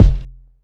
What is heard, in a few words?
kick